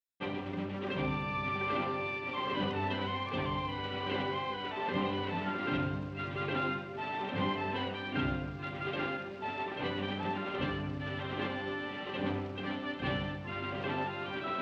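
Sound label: Bolero, trombonists; Rochut; joannes rochut and bolero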